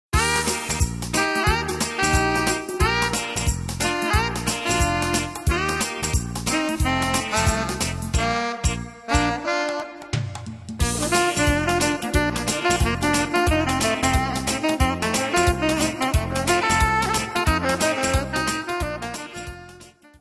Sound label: guitar mambo